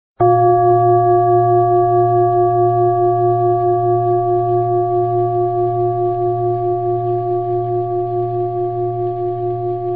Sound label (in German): bengalen klangschale